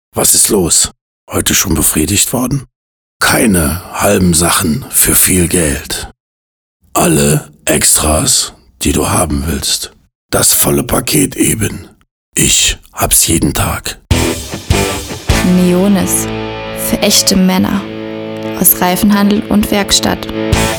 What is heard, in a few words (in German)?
jingle; neonis; ypsystems